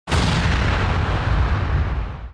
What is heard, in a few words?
Explosion Building Large